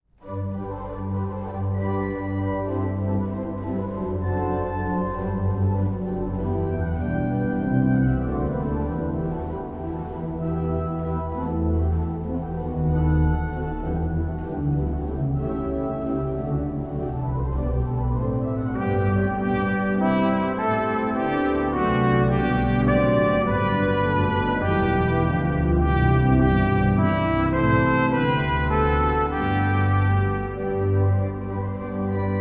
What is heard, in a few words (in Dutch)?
Improvisatie (hoofdorgel en trompet); Halleluja eeuwig dank en ere